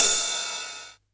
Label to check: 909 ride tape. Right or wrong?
Right